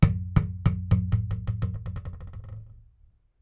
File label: Bouncing plastic bottle